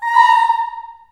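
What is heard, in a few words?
A#4 child oohs, Choir; Acoustic & Synth; USB Soundscan vol.28; AKAI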